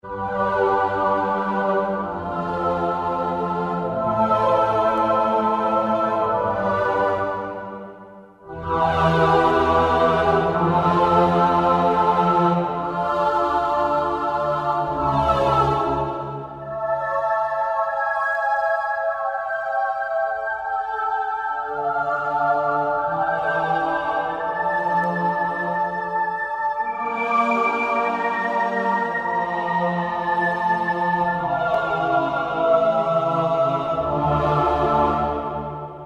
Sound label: Slow Ahhs, Alesis Z3; Sanctuary